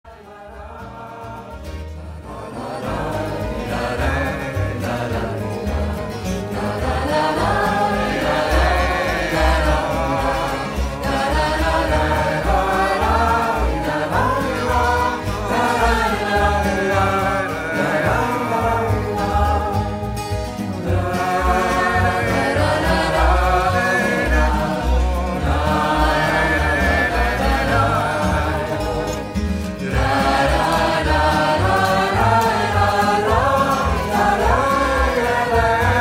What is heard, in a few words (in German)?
Freies Heilsames Singen Seminaraufnahme; Klangbeispiel